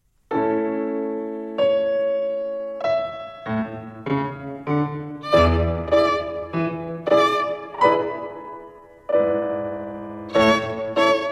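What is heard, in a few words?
Rhythm with Three Fingers Second Twinkle Rhythm, violin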